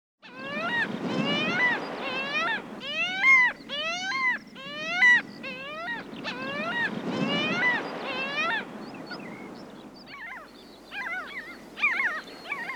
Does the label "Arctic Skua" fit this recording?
Yes